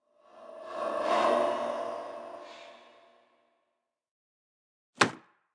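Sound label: Mini-game passed; house of 1000 doors serpent flame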